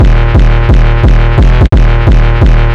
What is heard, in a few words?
phonk cowbell; phonk toolkit; rocket powered sound